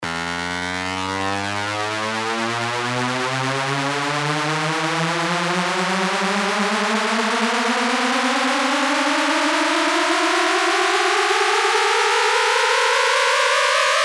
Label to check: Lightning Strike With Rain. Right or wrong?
Wrong